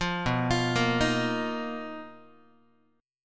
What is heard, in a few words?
B Major 7th Flat 5th B down Guitar; BM7b5; arpeggiated; JGuitar